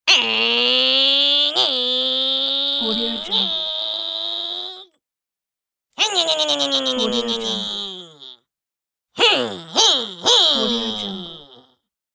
cartoon voice race car sounds

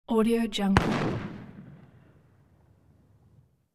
black powder musket; hollywoodedge

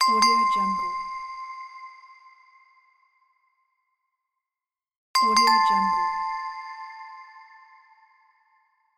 light bulb

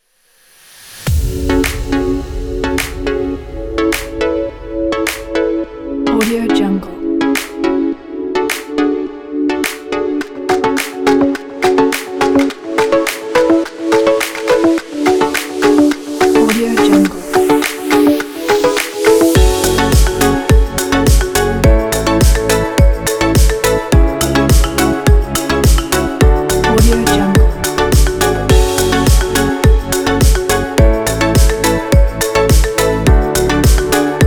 fashion upbeat

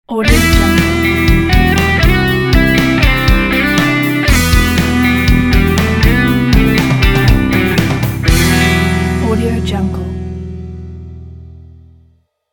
sad lead guitar logo

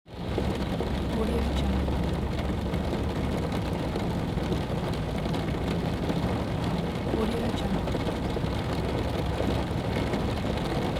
rain inside car

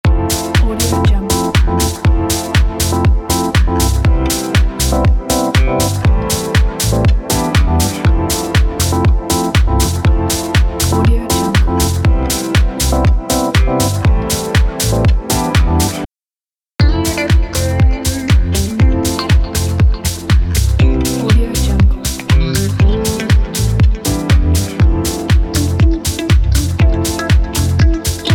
fashion loop

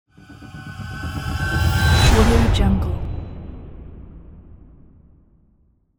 overload

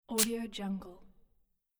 spray

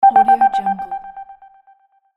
satellite signal alert

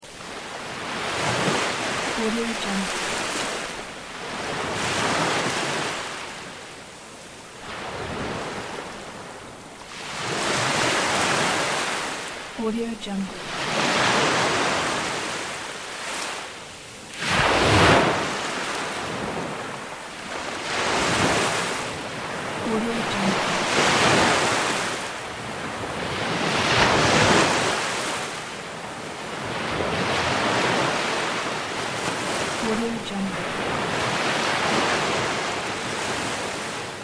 ocean waves coming in

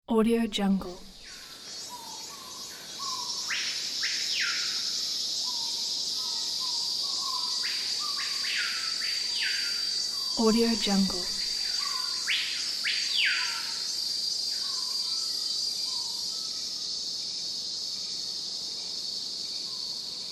morning in jungle

